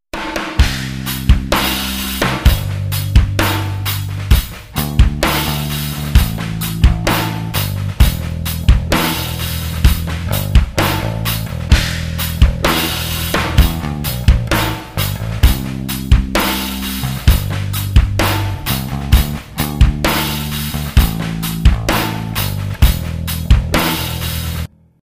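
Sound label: Hot Connections jam, Rhythm guitar; Seite 21 Hot Connections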